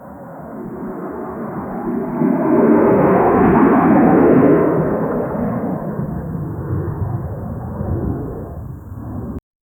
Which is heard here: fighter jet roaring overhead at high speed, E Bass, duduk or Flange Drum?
fighter jet roaring overhead at high speed